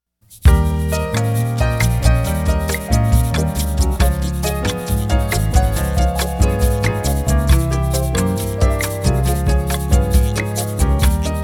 Acoustic Piano Mix

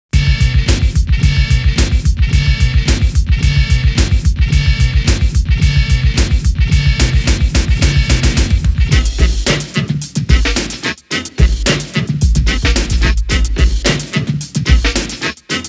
(No Noise instrumental)

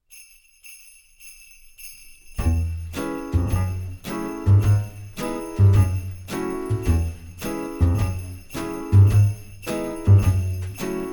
(instrumental karaoke); Frozen

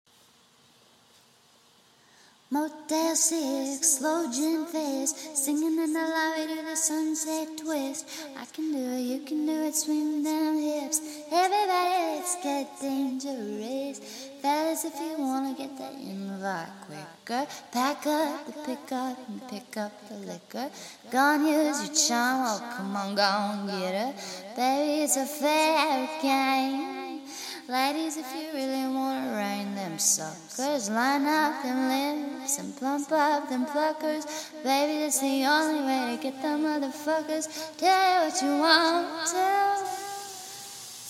Acapella

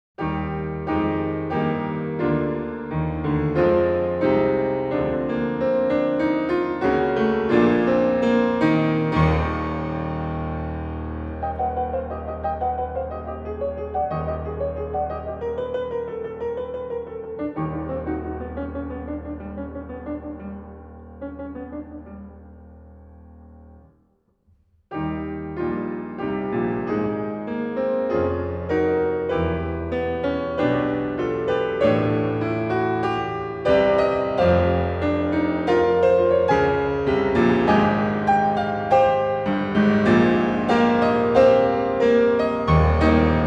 Fugue in C minor, Piano

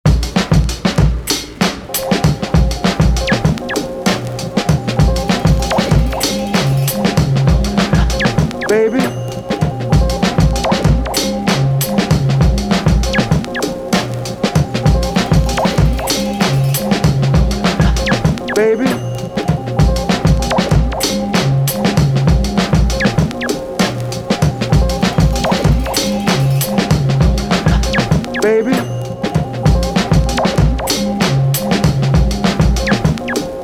Shouts (instrumental); Ruff Draft Beats

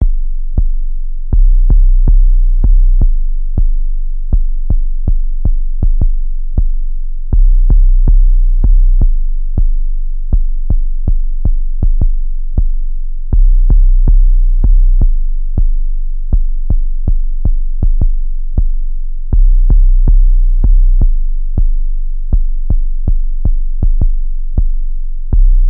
Bass Sequence

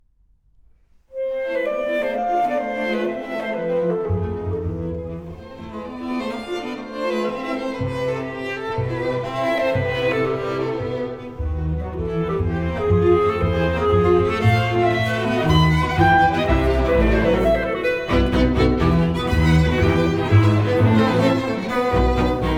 clarinet and strings; Mendelssohn; Lieder ohne Worte, op. 85; No. 2. Allegro agitato

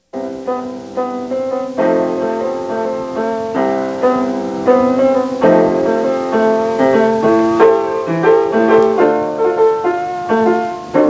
Piano Drum Instrumental

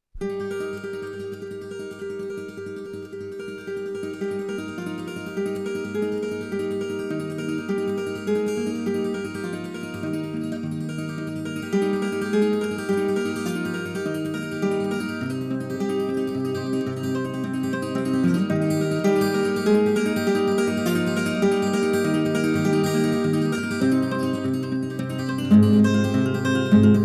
Improvisation For 12-String

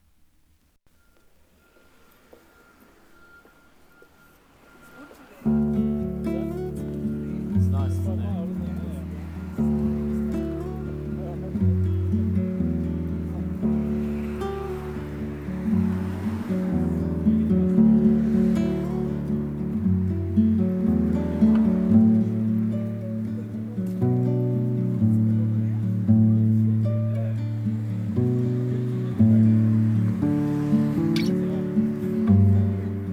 Guitar

